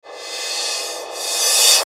CRESCENDO REVE, Crescendo Reverse Metallic Shimmer Fast; Production Element Imaging Element Accent Transition